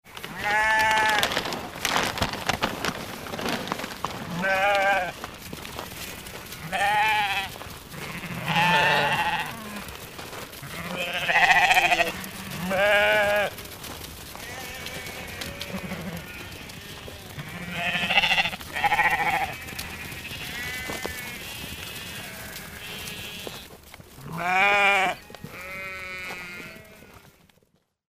farmer takes bag of food to field and sheep get very excited